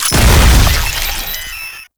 Proto Infested Maggot Explosion

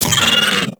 Bug Vocal Pain; Hunting Critters; Inf K Drive Bug

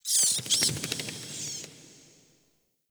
Interior Cave Bat; Ambience; Warframe; Duviri

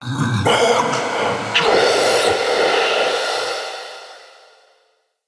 Male Vocal Enemy Spotted; Mockery; Mockeries

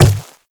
Infested Footsteps Quadruped Medium Walk Dirt; Warframe

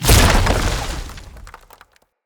Wrath Head Spike Spawn; Bromius; Abilities

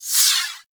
Fighter Rager Fire Whiz By; Grineer; Space Fighter Rager Agent

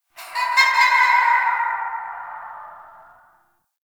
Canvas Laughs; Gameplay; Warframe; Shadowgrapher

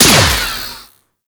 Mech; Warframe; Weapons; Strun Prime

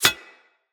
Bullet Impact Armour; Weapons

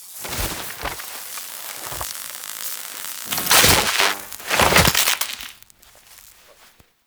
Shotgun Foley Recovering; Cinematics; Grineer Eidolon Shotgun; Kahl

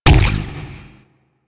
Mine Impact

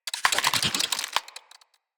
Root Spider Mother Foley Spin, Spiders

